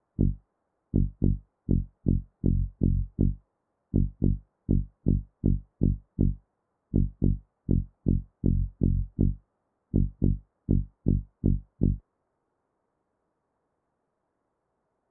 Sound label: Bassline; Chippy; switch windows gamerip 2022